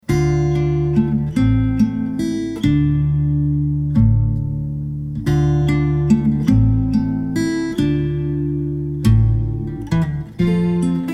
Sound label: Full Olde English; Noisebiscuits